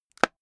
spectacles case close hard

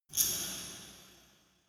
dark resonator hit single soft

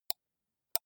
computer mouse left click single slow

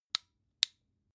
flashlight on slow

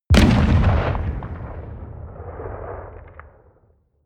artillery medium distant

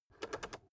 rotary control full turn

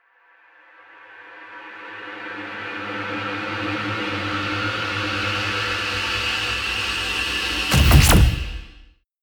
promo pad

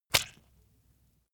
leather impact mud soft squeeze

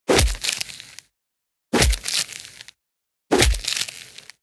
heavy smack

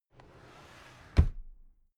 drawer cabinet carton close regular

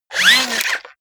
robot movement very fast

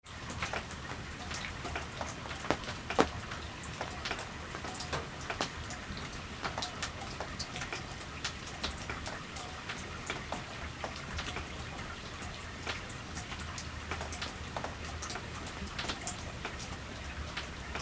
weak rain

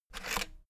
rotary control plastic rattle turn back

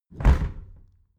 window close heavy

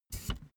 office chair hydraulics down stepwise

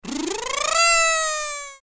kazoo

